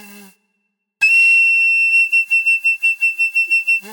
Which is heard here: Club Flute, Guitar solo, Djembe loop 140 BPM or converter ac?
Club Flute